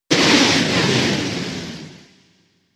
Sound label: Car Crash